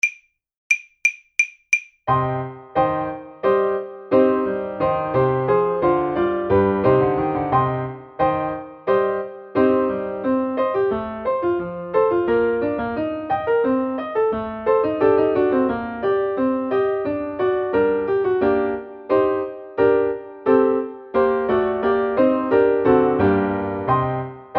badinerie, saxophone

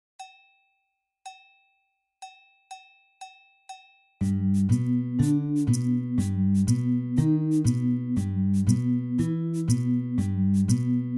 basson

